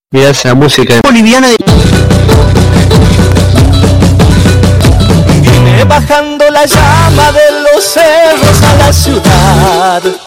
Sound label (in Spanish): musica